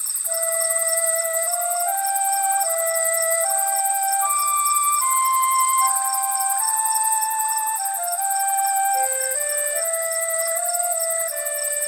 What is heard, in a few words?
Songbirds At Twilight, Nature Sounds - With Music; Summer Sunsets; The Sounds Of Nature